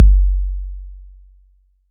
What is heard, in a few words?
Kick 808 Tone11; gate experiments